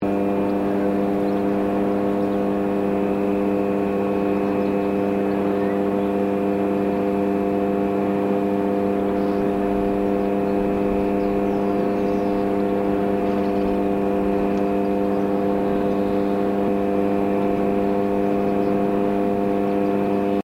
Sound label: Turbine Wind Power Transformer Loop, Wind turbine; Transformers; Electronics